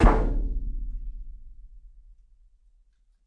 you dented my van, weird and interesting hits, drums single hits